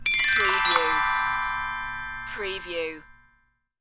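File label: Xylophone Descend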